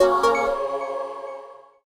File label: Sound Slot Machine Scored With Jackpot